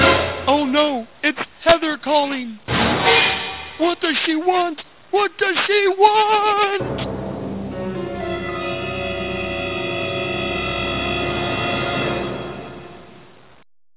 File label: RNB